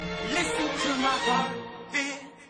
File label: Heartbeat